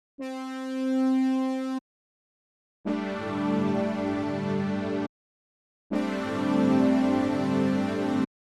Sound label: melodies Big Sus Chord; ARIA-XT